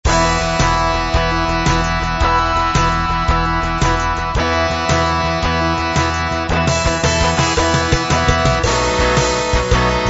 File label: rock shuffle